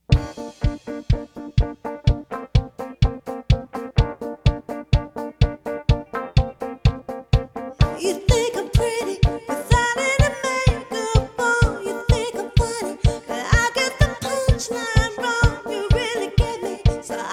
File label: Variety Band